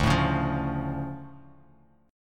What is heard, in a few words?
D Minor Major 7th, D; 10,12,11,10; DmM7 strummed; JGuitar